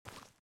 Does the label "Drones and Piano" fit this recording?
No